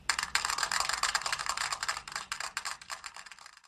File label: clapping boring